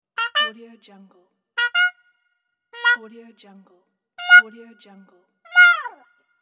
trumpet win score game

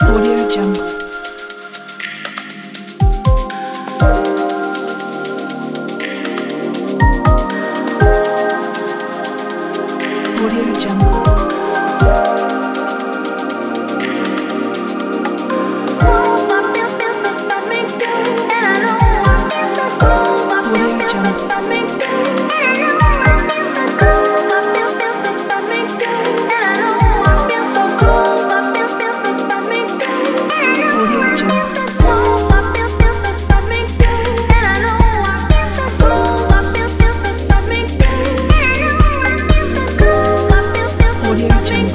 summer lounge house

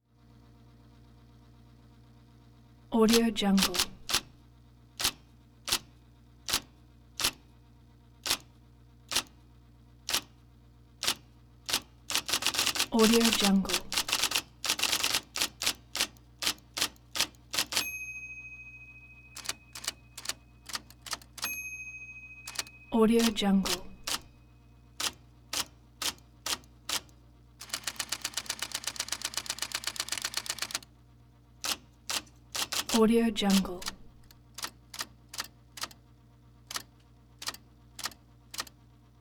electric typewriter on two fingered typing

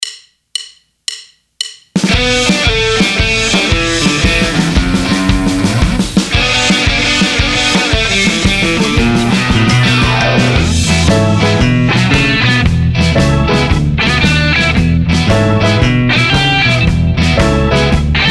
blues bar

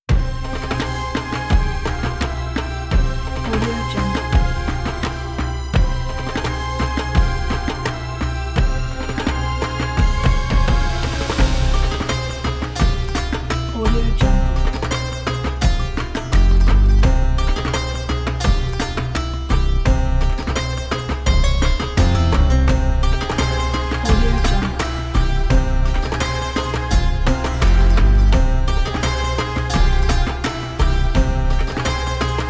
happy ramadan islamic celebration

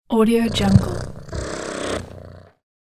cat purr

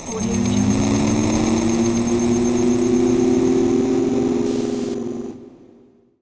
dark scifi transition

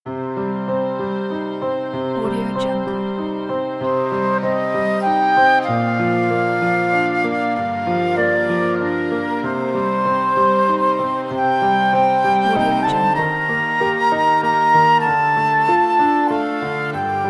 prelude for flute and piano

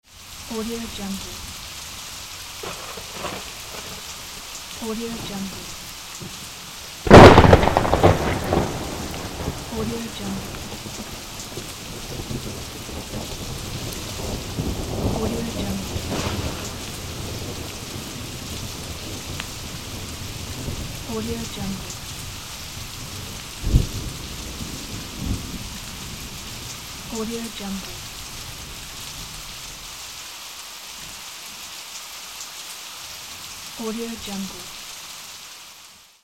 lightning strikes rain and thunder

